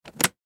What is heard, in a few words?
Switch Lever Move; Switches; Foley; Switch Sound Effects; Objects; Blastwave